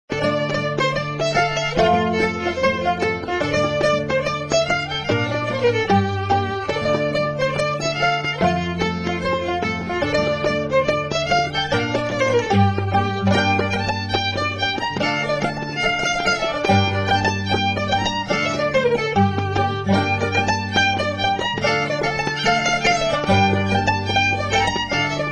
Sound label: strathspey scottish